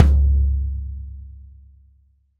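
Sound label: LD Low Tom, Roland BK-5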